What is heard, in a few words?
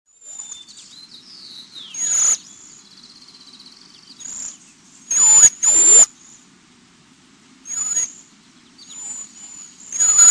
cedar waxwing